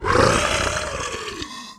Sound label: Slow Close Zombie Whirr; Voices